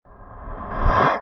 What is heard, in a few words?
ghost death; creatures